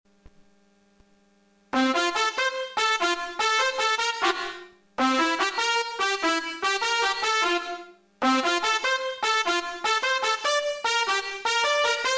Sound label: Magic Trumpet